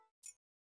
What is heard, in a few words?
Windows Menu Command; Pearl